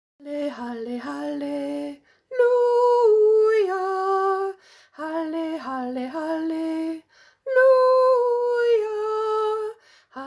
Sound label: Halleluja